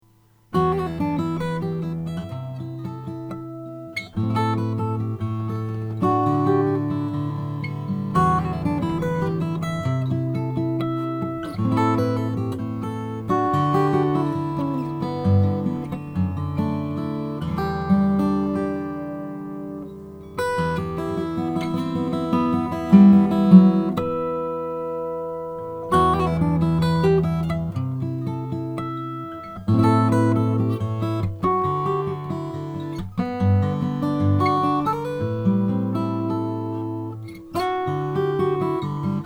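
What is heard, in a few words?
taylor gallery series living jew transparent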